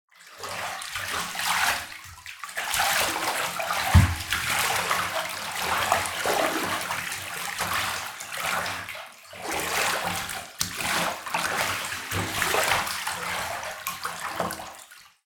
household bath water shallow movements washing; zapsplat

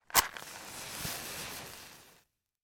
household matches lighting strike box many

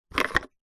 toilet bleach plastic cap lid unscrew; household

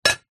household plate ceramic down clink

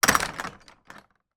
household wooden gate large shut; sound effects one

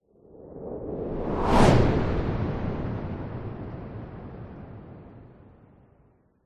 cinematic whoosh fast close doppler; sound design

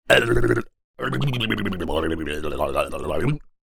cartoon head shake confused dazed male

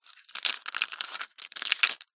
leisure poker chips handling short; zapsplat

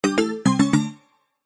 multimedia alert mallet and chime complete; zapsplat

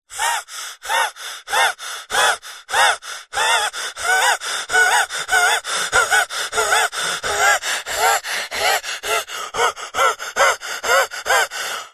human breathing fast laboured wheezing; zapsplat

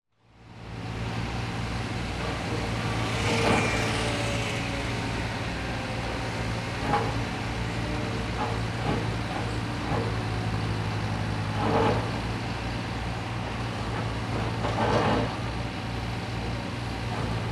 Ambience Construction Site; Just Ambience; soundbits

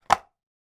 household box of plasters put down on table

